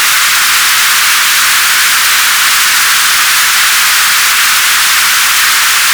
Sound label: HP Noise; Ableton Live 12 Lite; Vector; Sprites